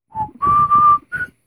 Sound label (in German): Pfiff; KTV Farben